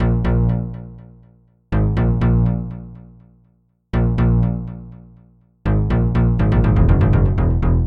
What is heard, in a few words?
SWINGING BASS